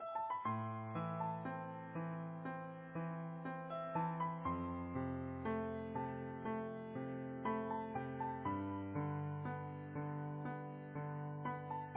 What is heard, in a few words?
Easy Piano